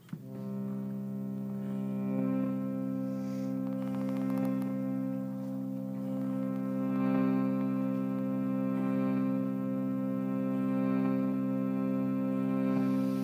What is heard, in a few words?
chant puja harmonium